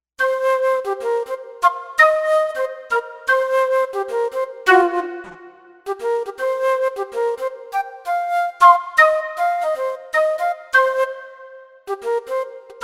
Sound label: VelSw Flute